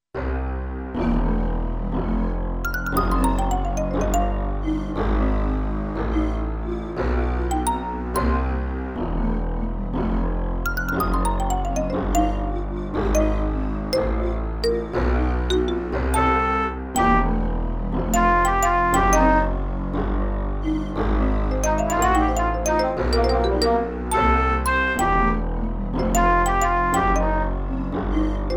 Marimba Breathy Bass Perc Bottle Tympello Wooden Flute song